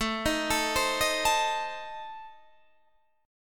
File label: A Diminished A down Guitar Open D